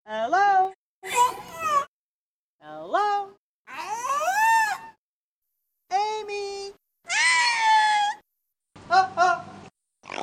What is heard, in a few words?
Orca Repeating Human Words